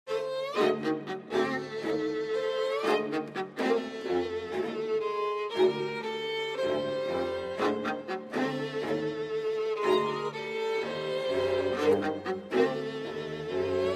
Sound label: Mokranjac kvartet